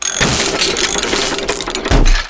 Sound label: dispenser build